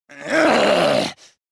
combat; reborn

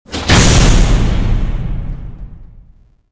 door fast close; movers

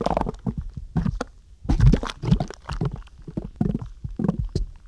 mud bubbles; The Elder Scrolls III Morrowind GOTY; XBOX

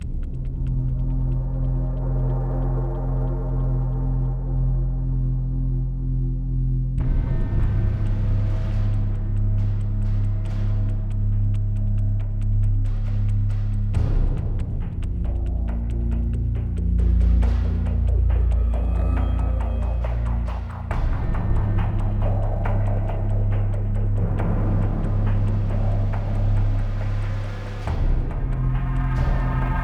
Menu Quiet Light; Tom Clancys Ghost Recon Advanced Warfighter; XBOX

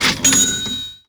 cash; langeles

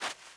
sand stop, footsteps

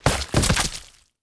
break meat

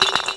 bouncy bottle non break hit; bouncy objects; Tony Hawk's Project 8; XBOX

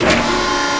machine paint servo start

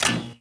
lights on

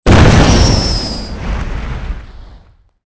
flare; Soldier Of Fortune II Double Helix; XBOX

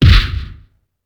HIT PUNCH L; UFC Tapout

